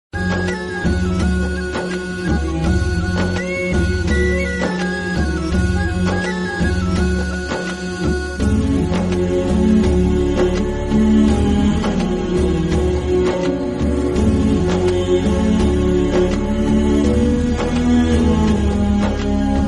telugu love feeling bgm ringtone; yevadu 2 movie

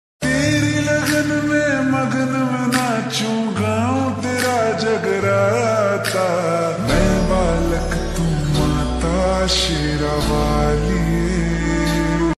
ringtone ringtone bhakti song durgapuja navratri

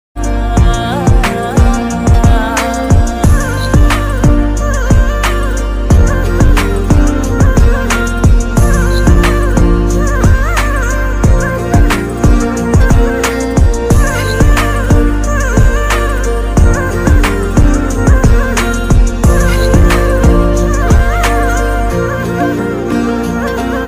Nepali ringtone, feeling ringtone from East Nepal

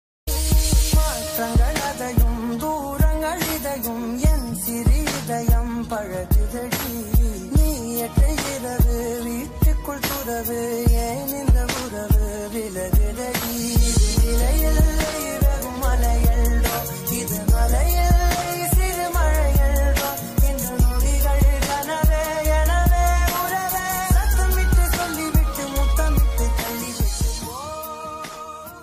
mix bgm ringtone; the bgm boy

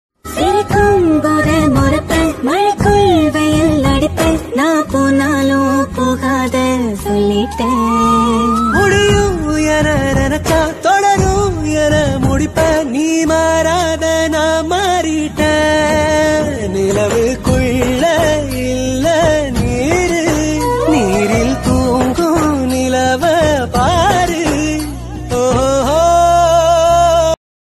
lovely song; sillunu oru kadhal; kayal

